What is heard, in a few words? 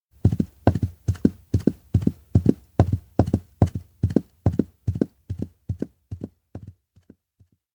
steps outdoor ground run; baron; anemoi